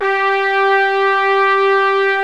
SYMPHONIC BRS

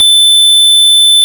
Farfisa; Farfisa Clar DBar

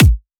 Dubstep Kick

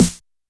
Snare Analog, YAMAHA RM1X

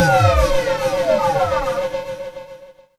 D+B

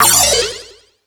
Crash, Drum Machines E-MU Pro-Cussion

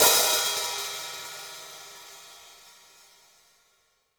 hihat - opened; JazzFunkKit

